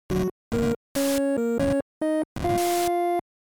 Arcade game 16 bit or 8 bit level complete win success